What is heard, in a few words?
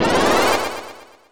boost; deltarune yellow translation